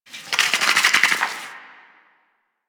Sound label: Cave Insect; Ambience; One Offs; Insects; Warframe; Quests; The New War; Drifter Camp